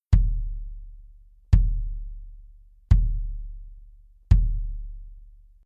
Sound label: BASS Soft Felt